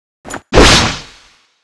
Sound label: sword bombard slash, weapons